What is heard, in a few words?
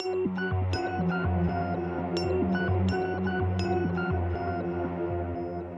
SYN, Hip Hop and R&B; Best Service; ProSamples vol.01; AKAI